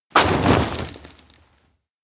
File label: dive into hole upper; ken; anemoi